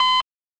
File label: beep test; music; Portal 2